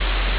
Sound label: ambience room tone